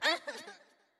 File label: Wimp Death